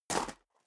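step dress boots gravel medium single right, footsteps; schritte; menschen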